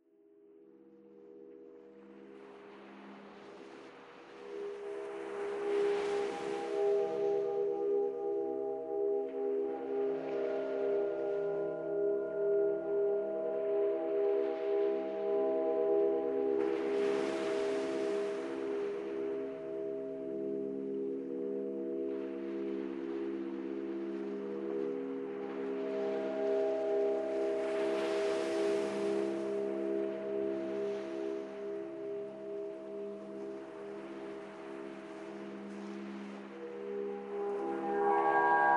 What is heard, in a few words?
windharp